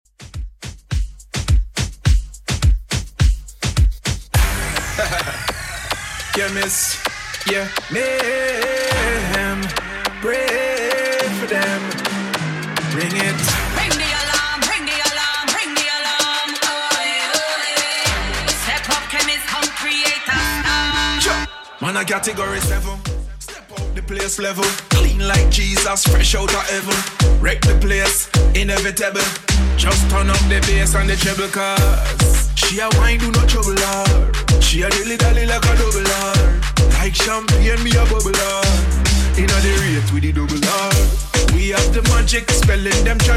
Moombahton 105bpm

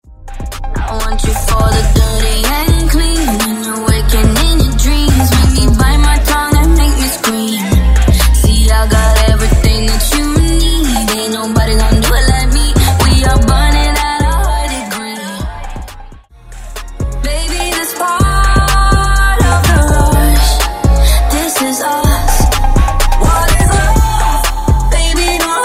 Mash Up Blend

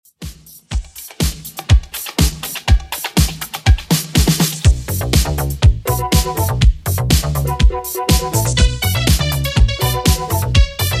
80s Redrum 122bpm

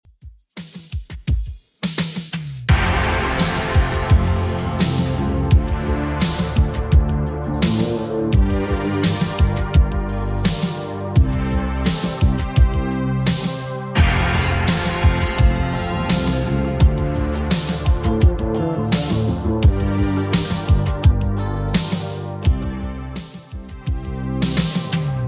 80s Redrum 85bpm

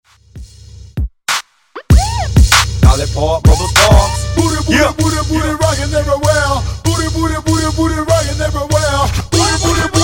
Transition 128 Bpm

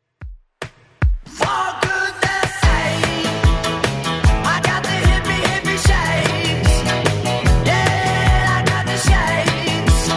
60s Rock N Roll Redrum 149bpm

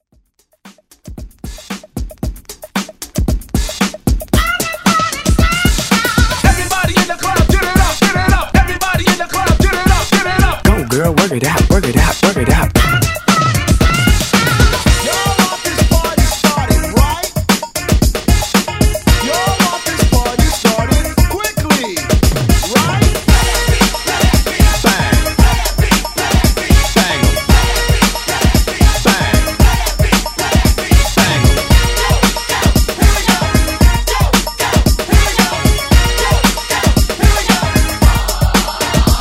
90s Hype Redrum

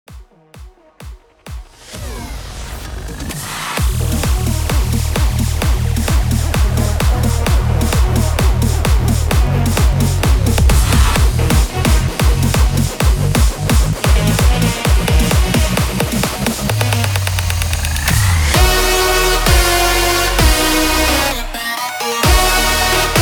130 BPM

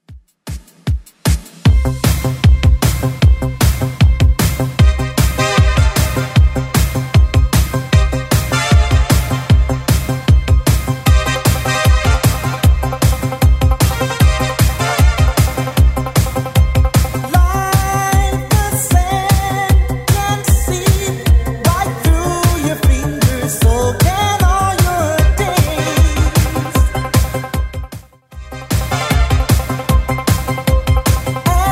80s, 153bpm

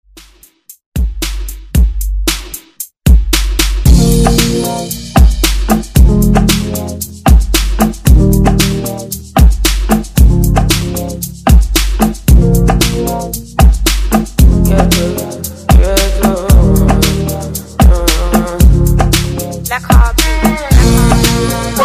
Afro Funky Redrum

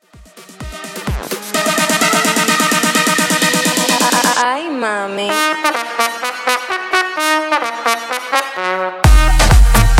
Extended Redrum

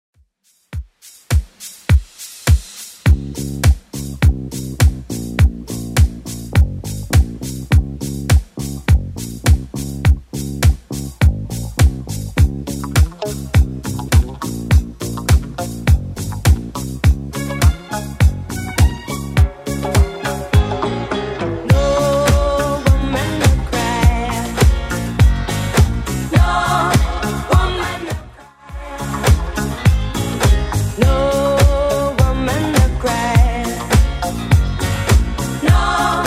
70s Redrum 103bpm

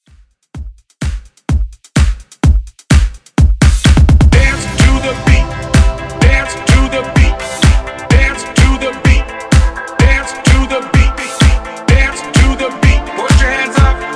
Throwback Hype Redrum

